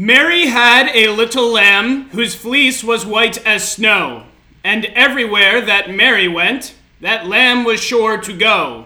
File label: Mary Had, 2200Hz